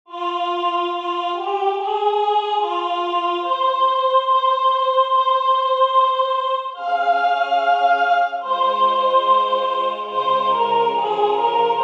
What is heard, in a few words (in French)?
Entre le boeuf et l'âne gris soprane